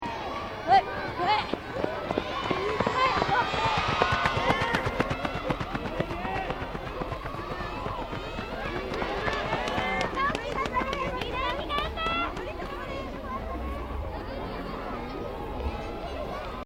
Athletics Runners Passing Background Cheering, School sports day Japan; Sports (Sound Effects:Backgrounds)